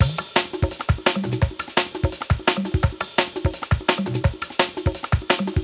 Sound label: Liquid Break